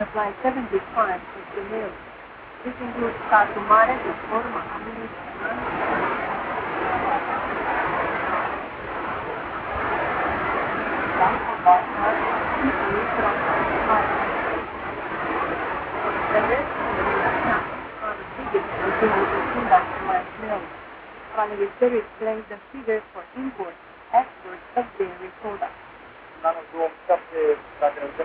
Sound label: OFF AIR FILTERED